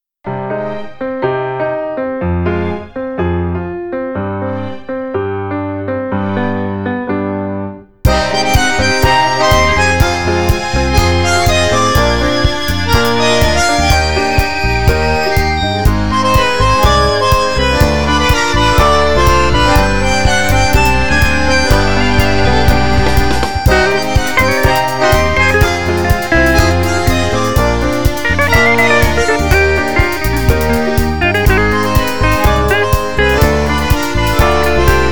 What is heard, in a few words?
Demon Tango; Glass; Theme Celes